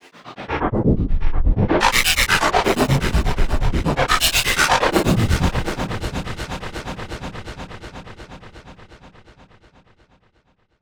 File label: Scritchy Scratch, Weird; Zero-G Ambiosis